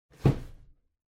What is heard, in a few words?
Cardboard Box Far Put Down; ダンボール; 遠い 置く; 梱包材